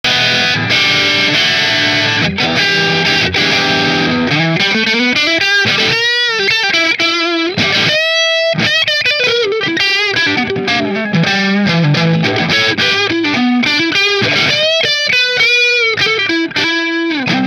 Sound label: Mcnaught neck marshall, guitars; purple